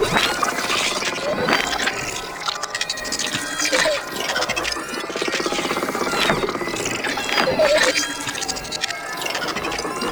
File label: Treasure Box Move Loop; Warframe; ManInTheWall